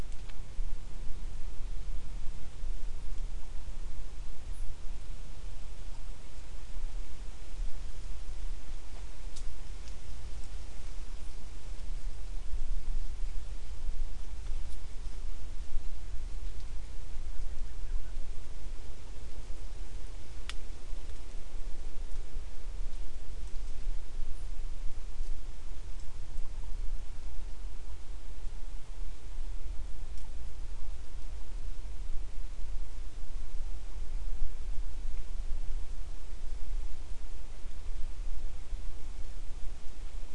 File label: no obvious biophony